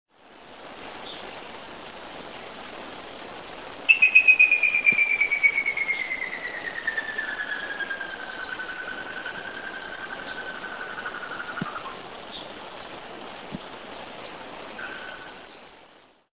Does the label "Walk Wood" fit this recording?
No